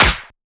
SnareClap; FLPRO

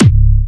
Fatterr C#4, Big Room Kicks

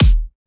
Kick, Kicks; FLPRO

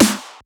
Snare; FLPRO

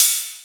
Ride; FLPRO

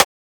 Transient; FLPRO

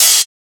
Open Hat; FLPRO

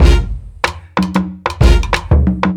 Loop

